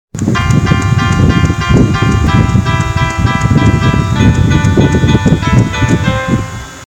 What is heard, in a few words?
Piano chopsticks